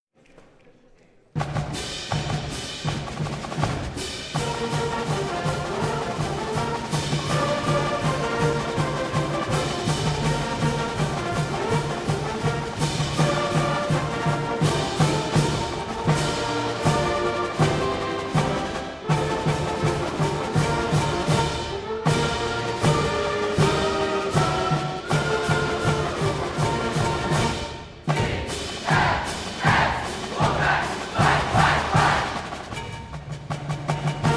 fight song

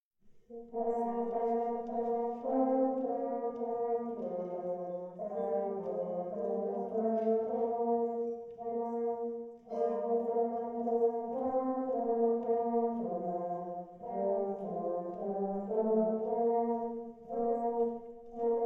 Horns Good King Wenceslas

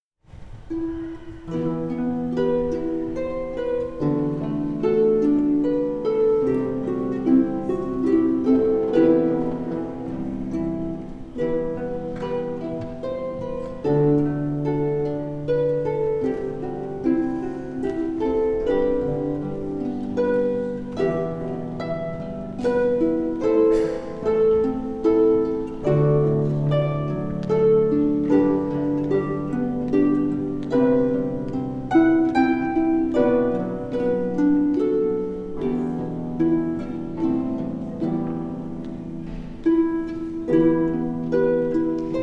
Harps

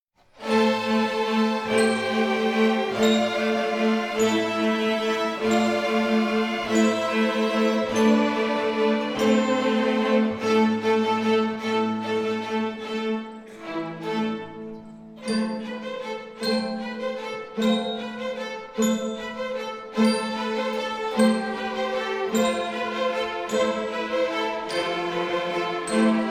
Ukrainian Bell Carol

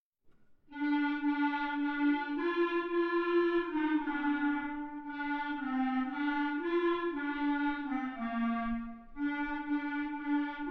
Saxes

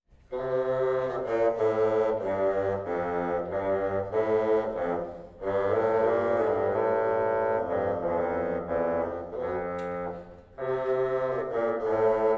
Bassoon; Deck The Halls

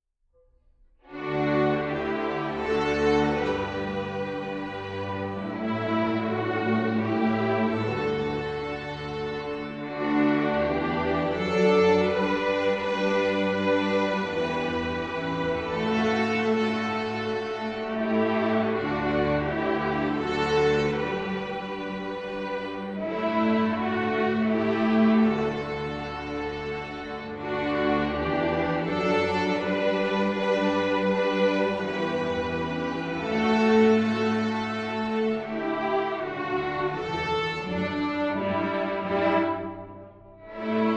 Waltz

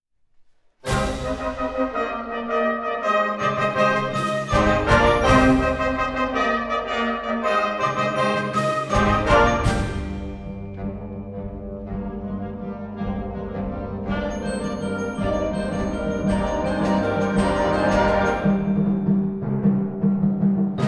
Ukrainian Bell Carol

